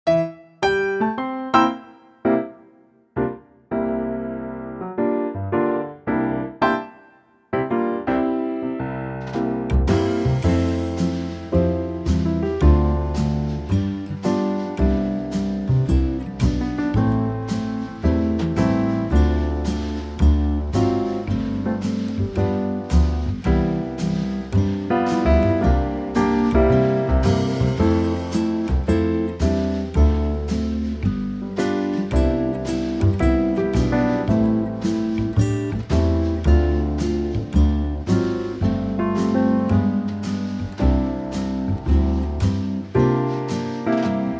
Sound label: C Quartet